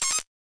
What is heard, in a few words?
electric lock